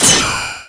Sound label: maximize power sword